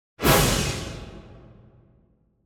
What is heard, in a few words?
Symphonic Hit Battle Finale - Short; Hollywood Edge; sound designer's toolkit v2